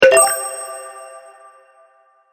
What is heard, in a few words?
Magic Menu Click; object sound effects